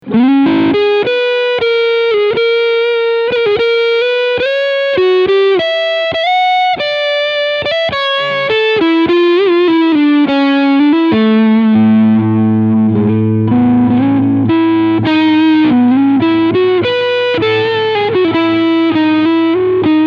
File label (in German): Reverend Flatrock E Gitarre Front PU Lead